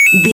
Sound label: retro collect pickup coin